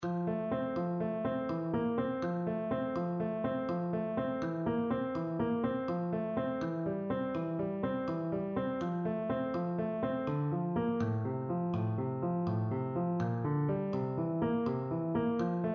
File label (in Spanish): los 5 dedos tresillo mano izquierda